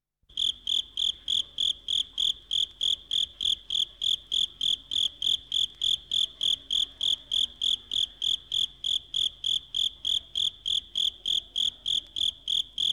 insetti insect; animali

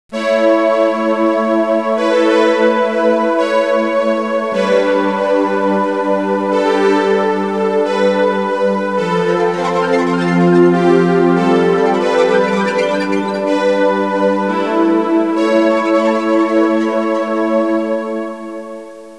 audio suonerie musica mistica

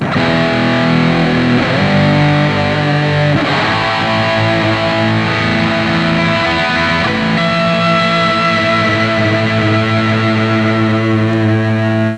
suonerie musica rock

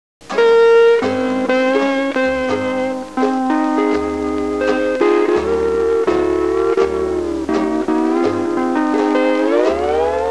suonerie musica hawaiian

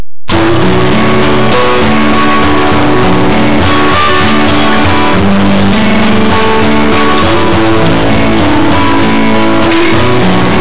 musica newage

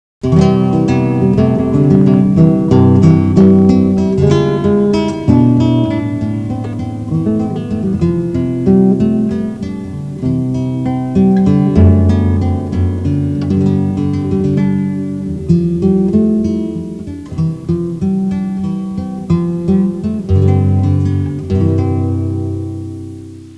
musica strumentale

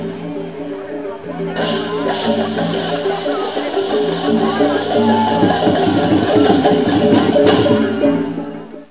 suonerie musica ritmica

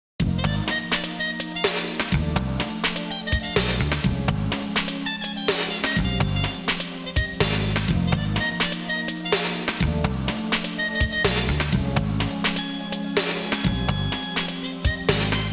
suonerie musica jazz

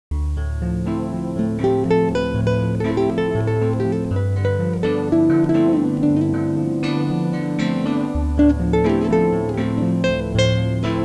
suonerie musica jazz

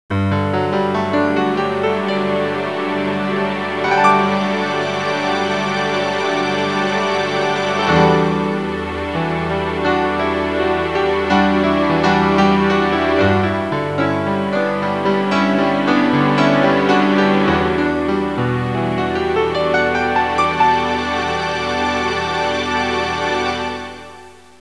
audio suonerie musica mistica

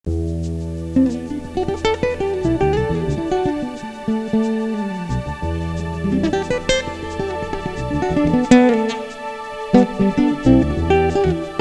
suonerie musica jazz

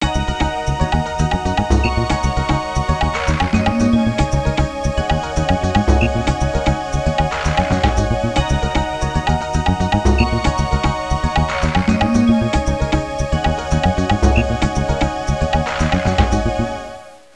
suonerie contemporanea; musica contemporary

